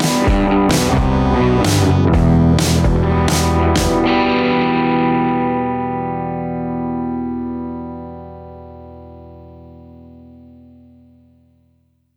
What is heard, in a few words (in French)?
jingle annonces; Libre à vous; radio Cause Commune